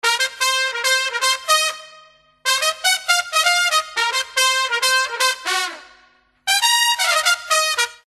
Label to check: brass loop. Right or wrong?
Right